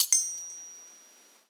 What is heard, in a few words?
ping